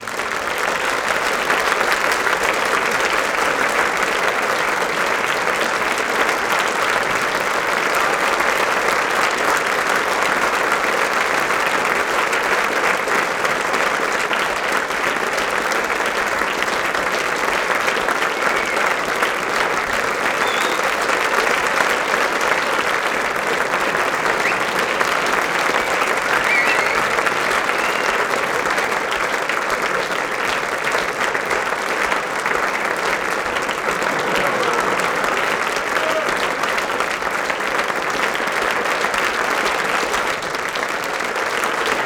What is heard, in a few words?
applause long; best service Blue Box